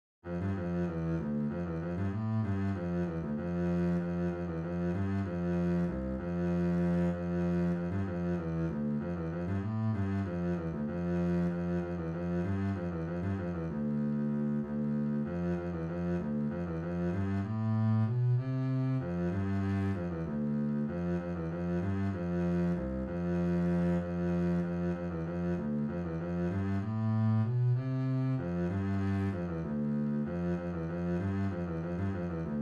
Estampie bass, string bass

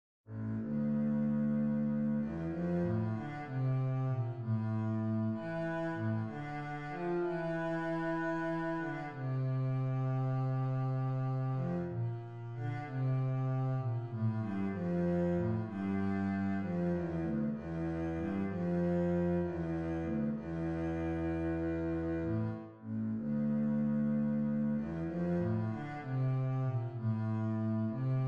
elegie bass, string bass

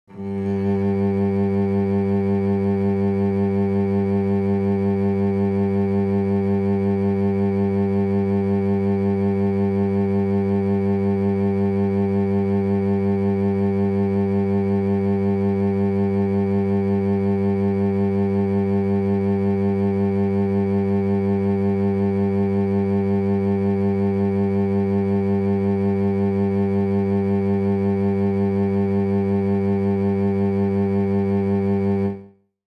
bass G, tune

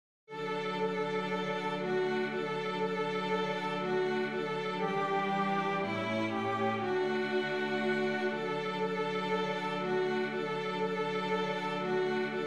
come children full, string bass; xmas

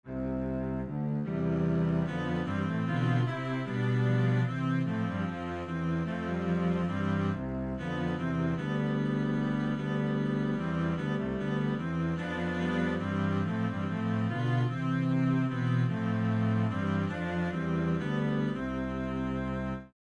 minuet, string bass

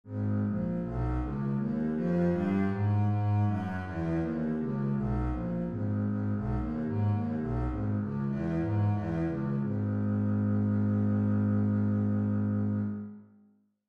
bass G sharp minor

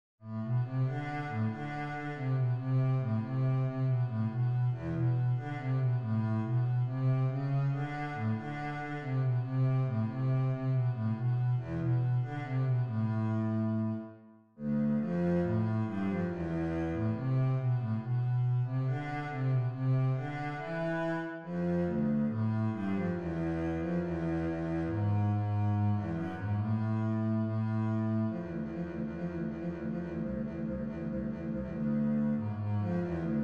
basso bass, string bass

